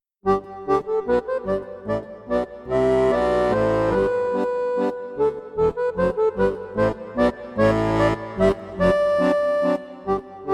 Menuet

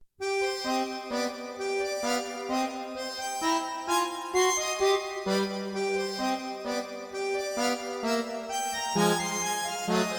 Menuet; accordion; classical suite

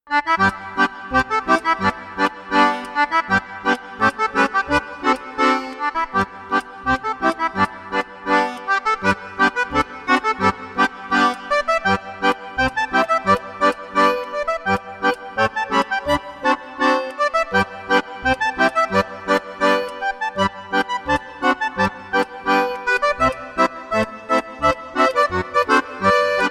Country Dance Duet

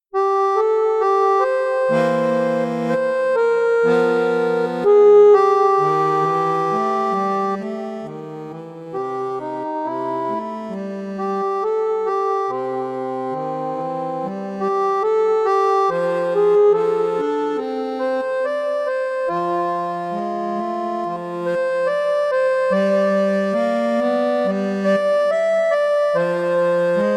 Cinema Blues

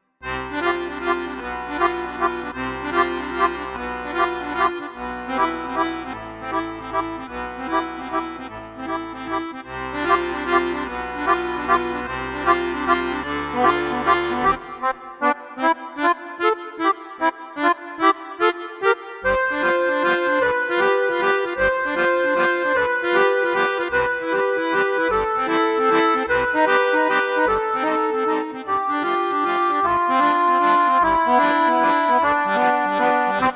Little Waltz Duet

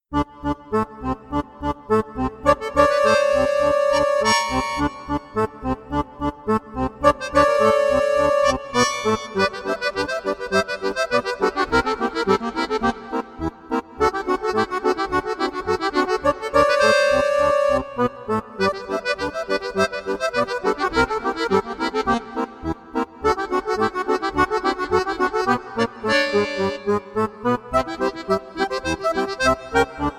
Train Trip Rag Duet

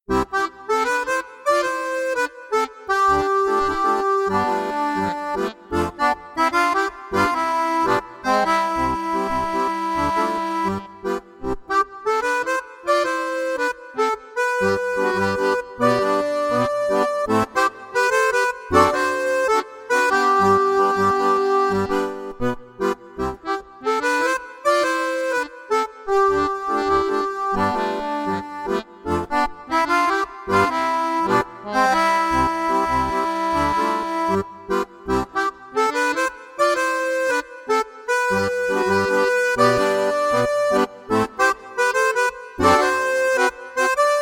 Summer Bossa Nova Duet

